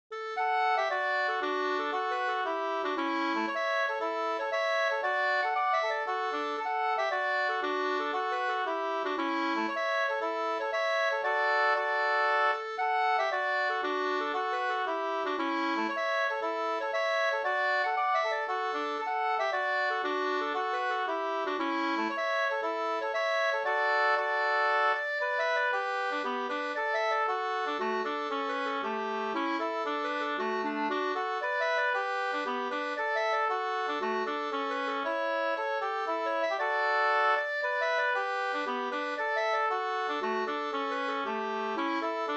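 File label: polska, two-part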